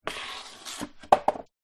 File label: skateboard scrape back on ground to slow then down